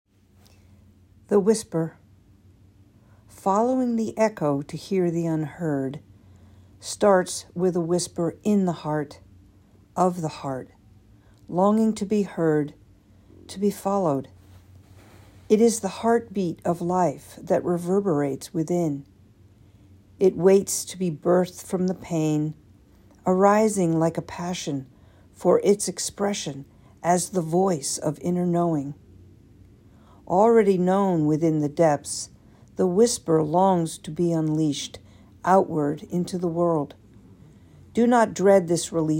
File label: the whisper